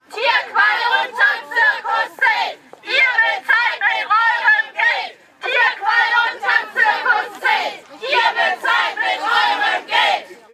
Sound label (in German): demonstration gegen zirkustiere; zirkus probst